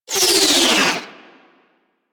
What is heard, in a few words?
Ship Dual Cannon Tail; Crp Moa Dual Cannon